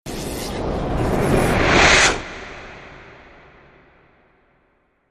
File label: Airlock